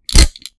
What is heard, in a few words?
Switch